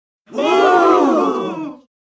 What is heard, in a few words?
Group 12 Yr Old Walla